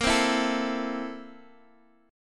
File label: A Minor Bb, Am/Bb chord; Am/Bb strummed; JGuitar